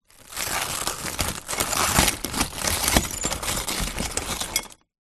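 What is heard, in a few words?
broken glass bottle pieces crush grind; zapsplat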